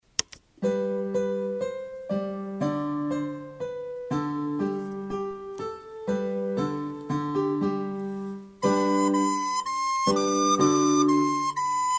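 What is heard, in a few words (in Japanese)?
よろこびの歌①（伴奏+メロディ）, リコーダー; 成隣小学校 WEB音楽室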